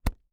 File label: Impact Cloth Hit On Body